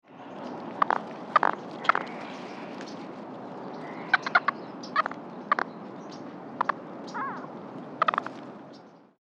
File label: rluteiventris release